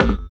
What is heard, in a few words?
PERC, Drum & Bass, 160 BPM